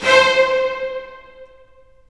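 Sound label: STR, strings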